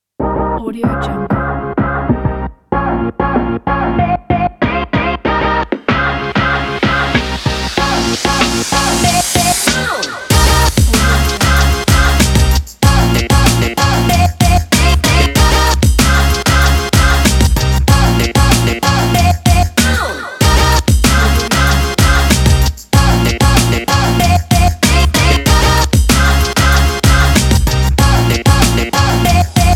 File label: hip hop